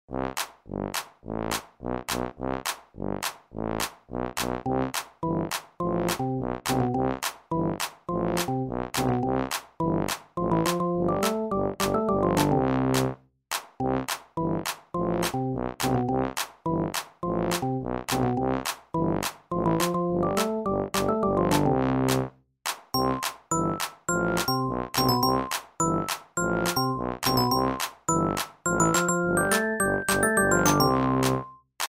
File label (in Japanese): ステレオミキサーで音の左右パンを作成